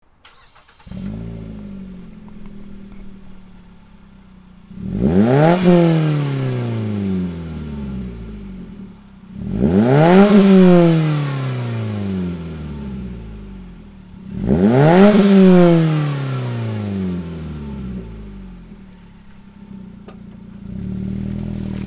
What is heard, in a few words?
launch; magnaflow